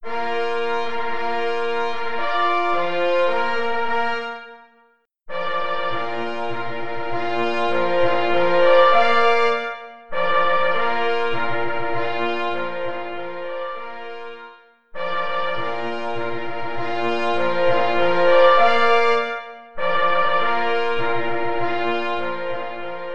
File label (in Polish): Marsz na 2 plesy i parfors; J. Rentflejsz; Marsz jubileuszowy